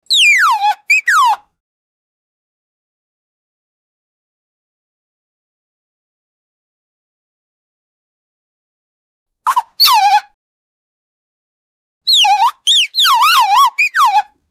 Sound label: clown cartoon sounds